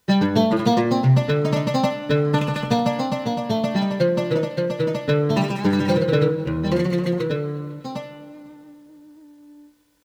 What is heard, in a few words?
Oud; korg; EXB Solo Instrumente